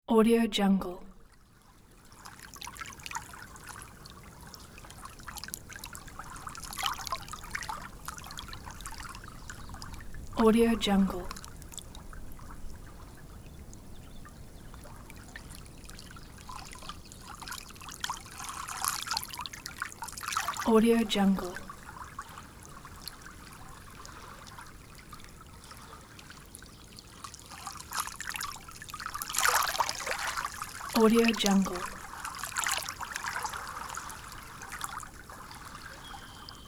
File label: lake